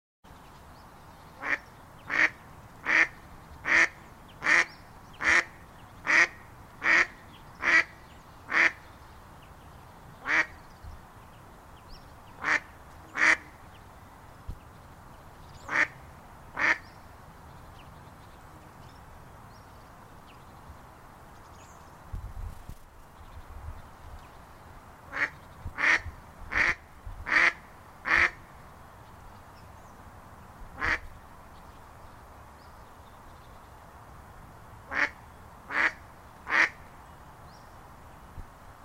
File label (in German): Stockente, Tierstimmen